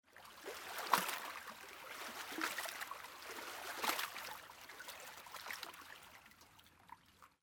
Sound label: Water On Boat; Age of Empires III; cinematics